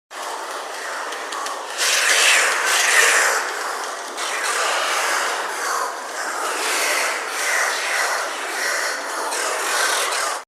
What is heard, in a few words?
Oilbird